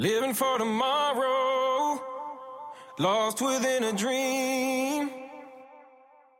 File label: Vocals